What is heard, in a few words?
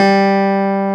CLAV G; Sonic Reality; Vintage Timetraveler; CLAV PIANET